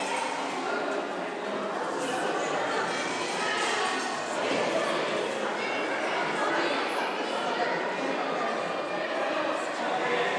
museum lobby atmos

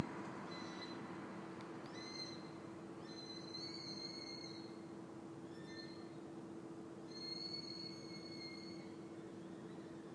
foxes screetching in the park

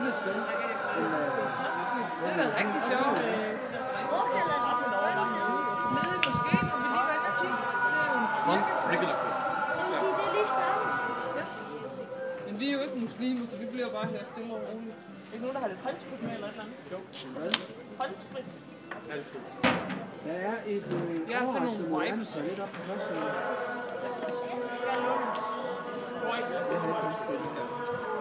call to prayer

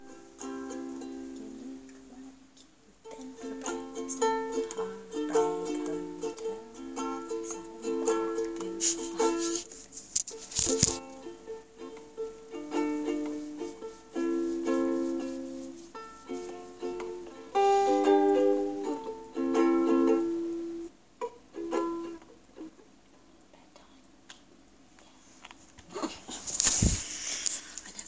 ukulele

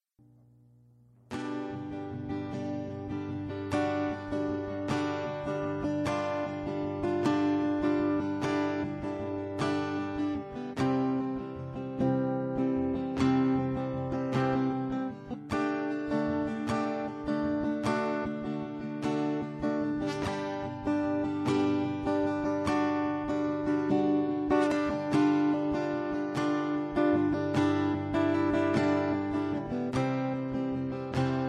guitar chords

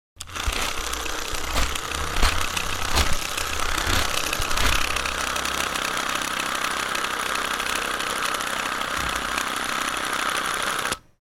Bell & Howell Filmo Sportster Cine Camera (1930s)

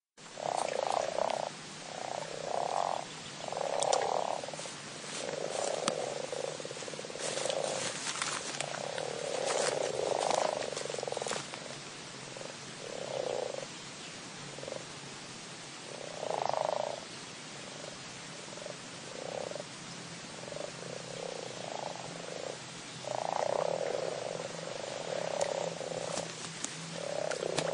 frogs

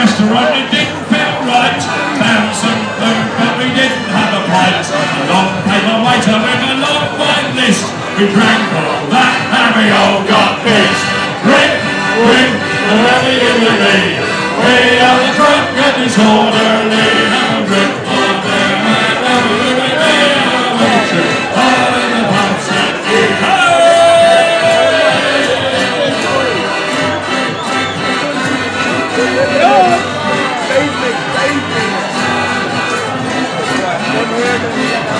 the steam fair choir

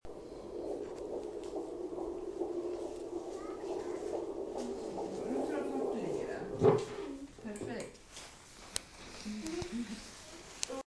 heartbeat

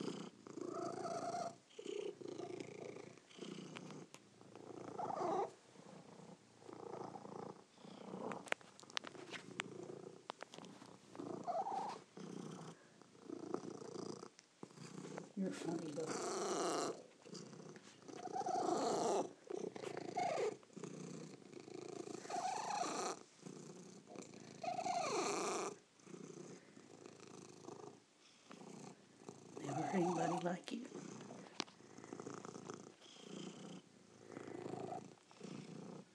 the cat weird purr; ogart

